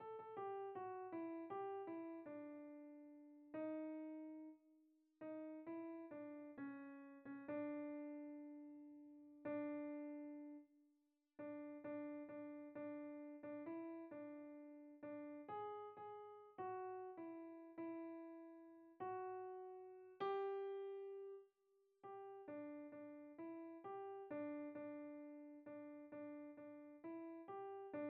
SATB Soprano Predominant Alto

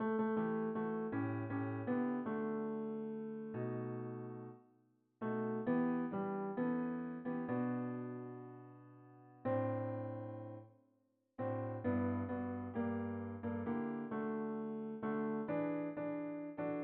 SATB Tenor Predominant

